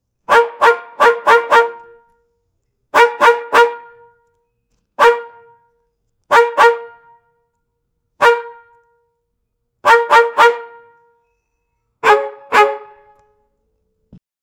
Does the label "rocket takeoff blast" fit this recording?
No